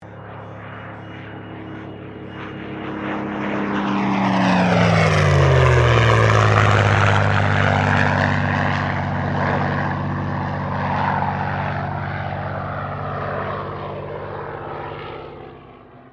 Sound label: AIRCRAFT SUPERMARINE SPITFIRE MK-XVI PASS